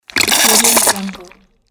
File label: sucking